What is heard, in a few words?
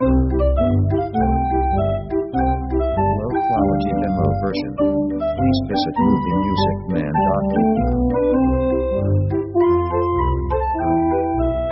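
orchestra the tramp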